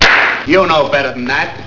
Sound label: slap